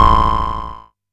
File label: Amdek Perc